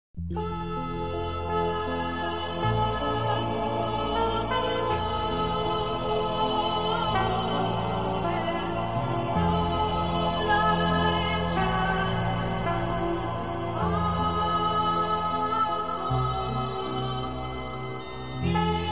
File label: Italian Song